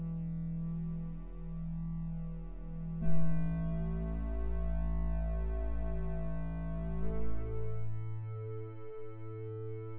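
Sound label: with drums synthetic convolutive 5cm; SASSEC07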